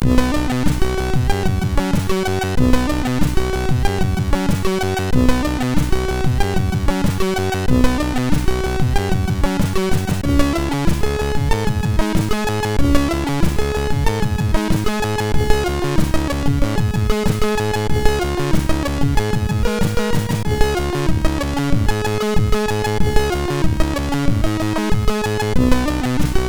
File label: intro, sid MOS6581R2; XLCUS News 5